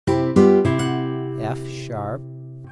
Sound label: F#4 Acc Nylon Guitar Major; ear training instrumental color